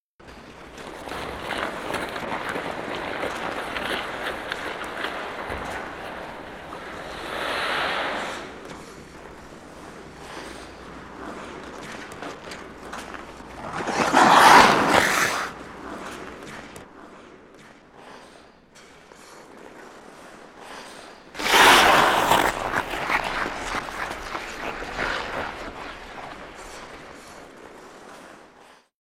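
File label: Hockey Players Skate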